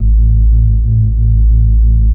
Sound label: sub A, techno trance bass; Techno Trance Essentials